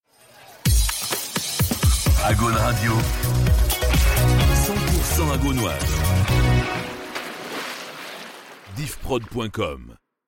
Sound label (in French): Radio Sweep ID Ete; Agaune Radio